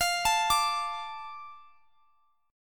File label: D 5th F; arpeggiated; D5/F; chord